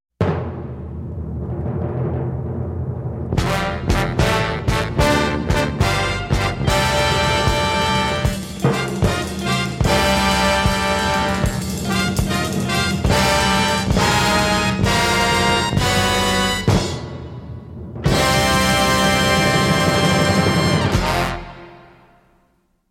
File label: Fanfare